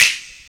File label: SNAPS; AMG - Now CD-ROM (Roland)